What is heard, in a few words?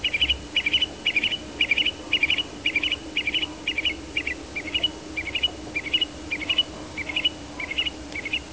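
call, Stone curlew, gallop call; This call was elicited by playback stimulation; The bird answered to the same call type (gallop); Burhinus oedicnemus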